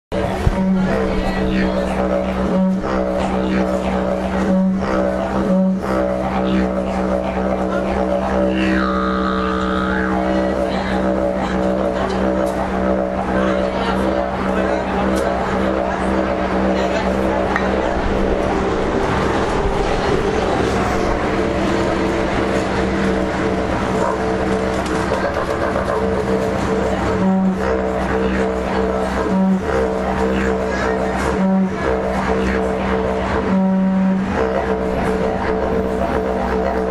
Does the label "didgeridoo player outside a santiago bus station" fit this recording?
Yes